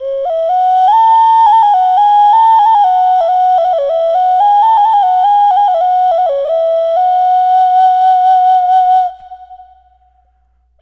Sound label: Aztec Dog Occarina; Ambient; Clean Flutes